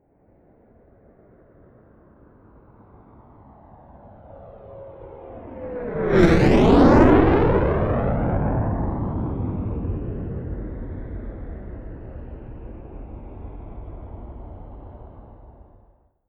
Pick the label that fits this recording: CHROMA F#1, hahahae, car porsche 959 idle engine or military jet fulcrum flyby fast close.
military jet fulcrum flyby fast close